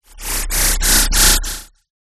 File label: sound design frequency modulation fast buzzing and noisy sweep